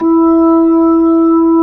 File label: B-3 E5, B-3; Keyboards of The 60's and 70's